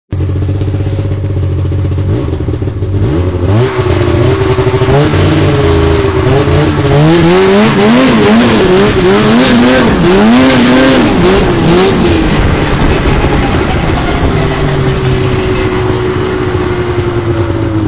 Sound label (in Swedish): skoter